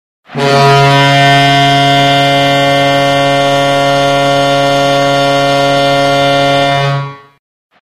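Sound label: signal of end of period in hockey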